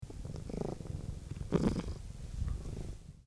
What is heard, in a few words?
Purr; Kitti